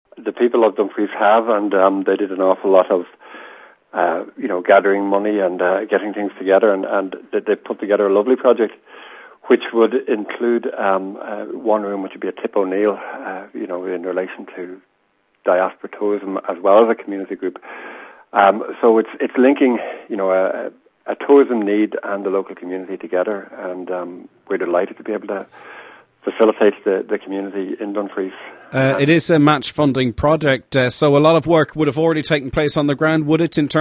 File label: DRUM